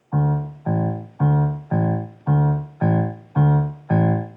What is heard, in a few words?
simple piano recordings